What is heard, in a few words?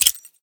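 pin, grenade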